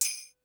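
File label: SLEIGH BELL, Hip Hop Misc Perc; E-MU Producer Series Vol. 8 – Platinum Phatt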